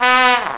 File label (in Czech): TRUMPETA; C kralik